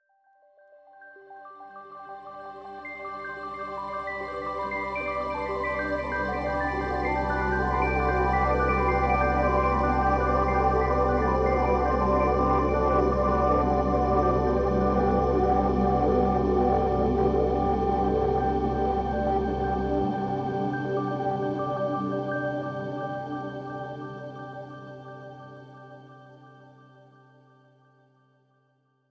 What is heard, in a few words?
pad Gmaj, synths pads; dontgo; base pack